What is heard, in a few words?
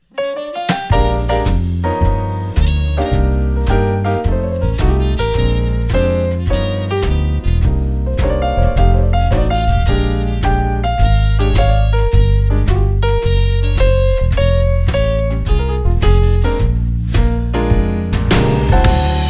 Dinner Jazz; jazzy